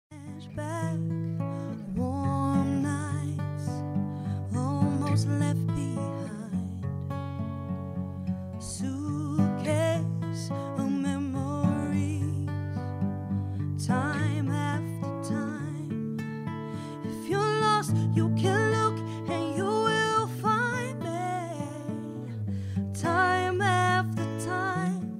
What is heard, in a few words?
Acoustic Duo Cover